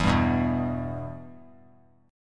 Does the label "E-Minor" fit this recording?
Yes